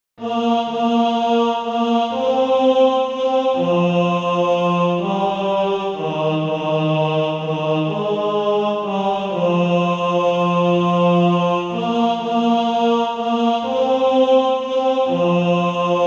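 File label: tenor, Sheet music (SATB) including Practise Music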